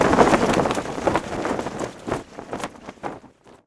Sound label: banner flag; weapons; tf2